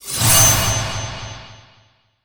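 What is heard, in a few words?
Summon Synchro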